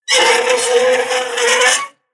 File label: scratch